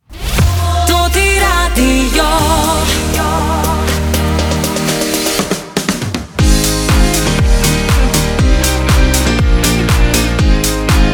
Radio Opener Day Show; Toti Radio

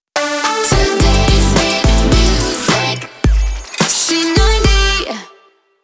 Jingle 107bpm Shotgun

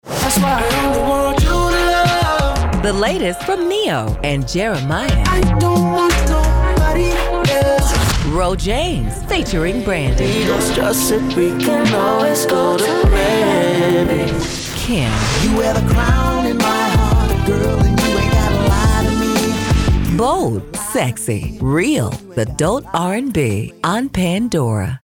Adult R B

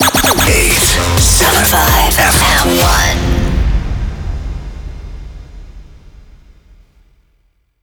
Jingle 128bpm Fm D Rapid Fire; 87.5 FM1 Manila

